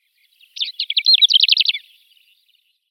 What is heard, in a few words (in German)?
Kurzzehenlerche Calandrella brachydactyla, Vogelstimmen